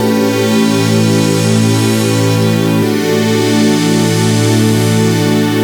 Ober Poly, 85bpm, A, analogue circuit; musicradar

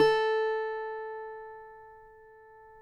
CUATRO A 4, Guitars; Spectrasonics; Hans Zimmer Guitars VOL-2

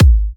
G#, kicks; markus hakala kicks

